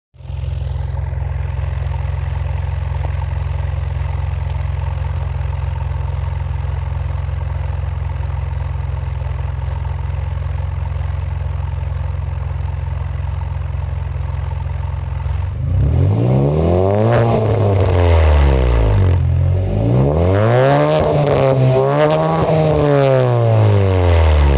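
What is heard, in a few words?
Forte Koup, اگزوز; سراتو کوپه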